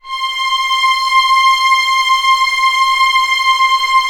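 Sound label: STR C5 FF, strings; Sound On Sound - Peter Siedleczek's Orchestra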